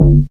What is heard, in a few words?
MODUL BUZZ